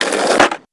stop skate; bucky

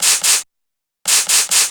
parfume

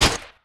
lights off; sherlockhd

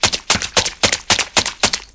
clap; orla

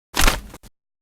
money; retinz

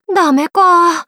tired; mai natsume

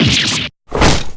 damage

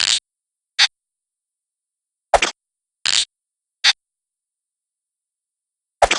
sniff

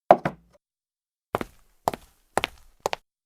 walkout

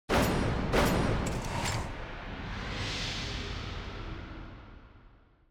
deduction complete; sherlockhd

